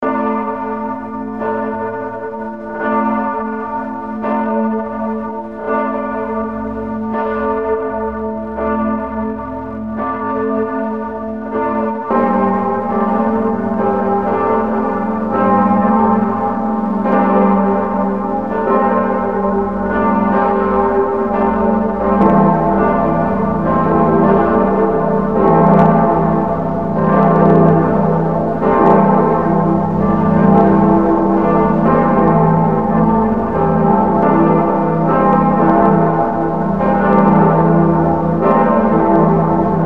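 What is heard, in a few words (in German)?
Berlin einstiges Geläute Glocken e0 g0 a0; das gelaut des einstigen berliner glockenturms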